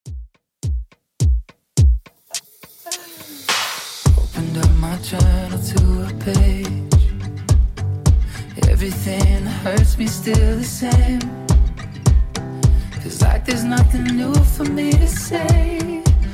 Top40 Redrum 105bpm

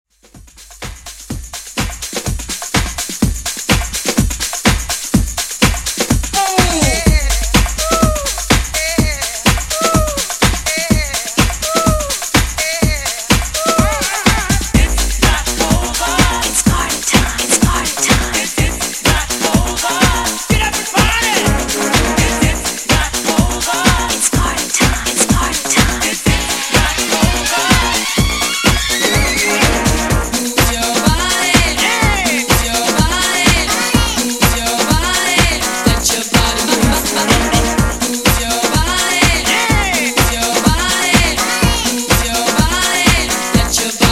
90s Hiphouse Redrum 125Bpm

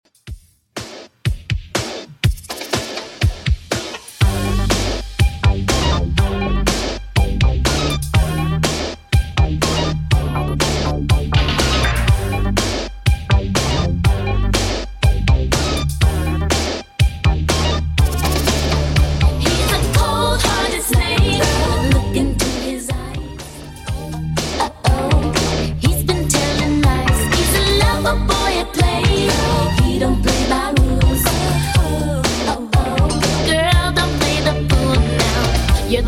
90s Redrum 122bpm

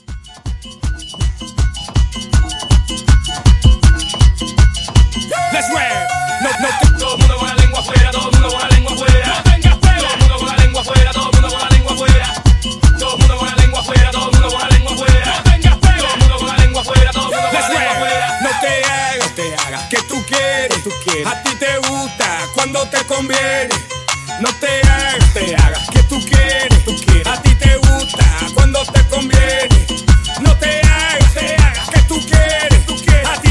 Hype, 160

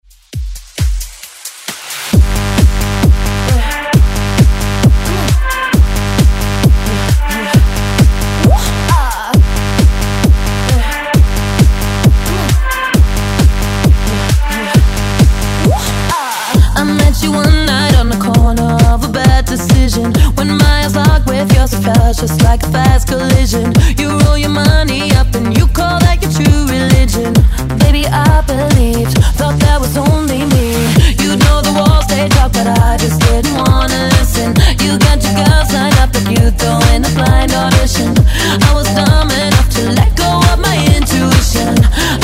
House; Redrum

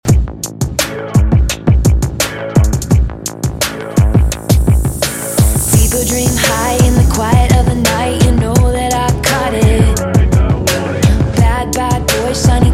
Extended Mix 85 bpm

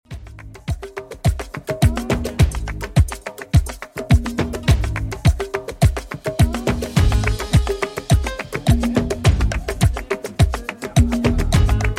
90s Tropical 105bpm

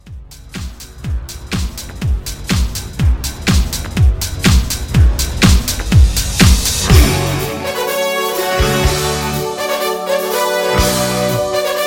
80s Rock House Mixshow Mix 123

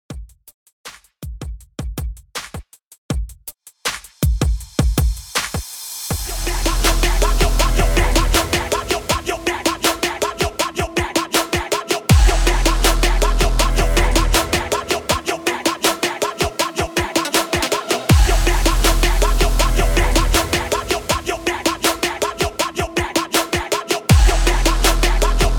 TRAP 80 BPM

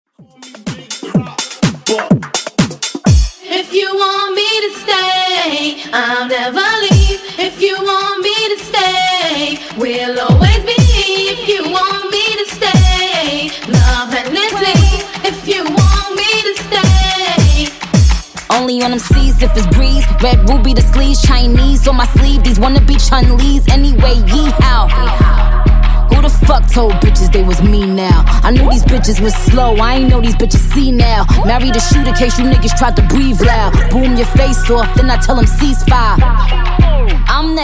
125 98 5Bpm; Dj Intro Outro Transition

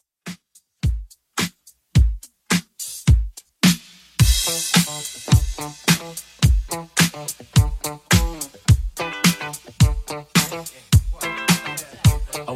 80s 107bpm; Redrum

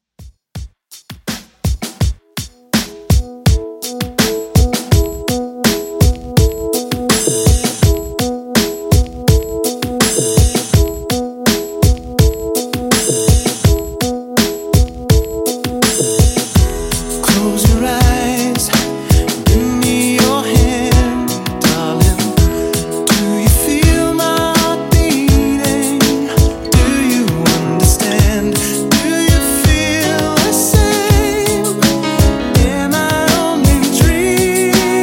90s Redrum 165Bpm

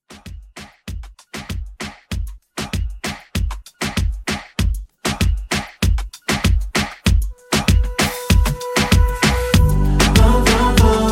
Reggaeton Re Drum 97

